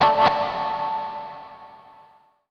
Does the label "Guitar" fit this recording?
Yes